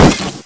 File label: Rapid gun